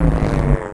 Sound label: hurt